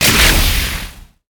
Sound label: weapon bow piercing shot